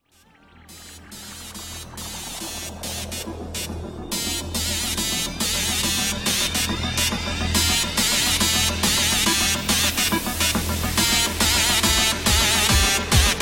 Dubstep; Ukf

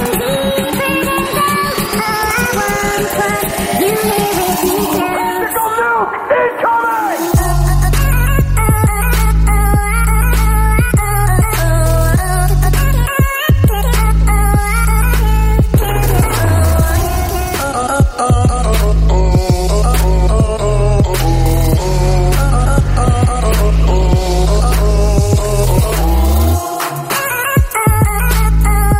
Trap

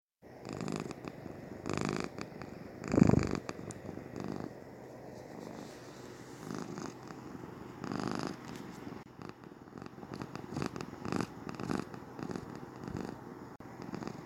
Cat Purring

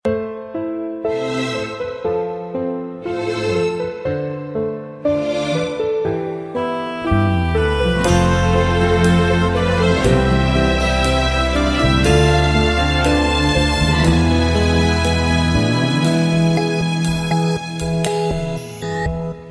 Lovely Romantic Tone

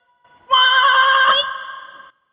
Waa Yit Message

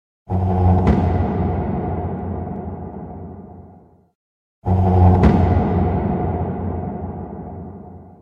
Spooky Beat Sample

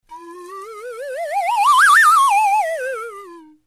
Slide Whistle

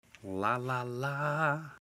Lalala

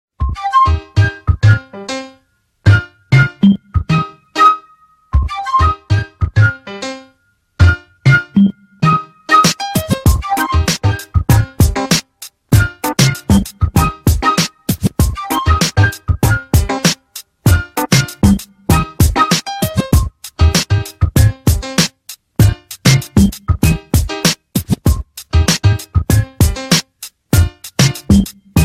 Gangsta Beat 72

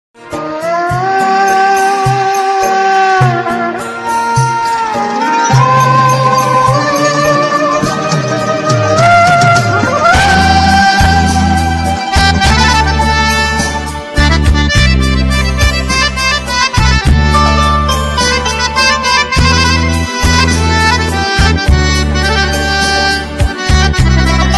Oriental

